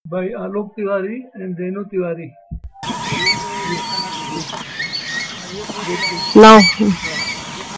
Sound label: Greater spotted eagle call; alok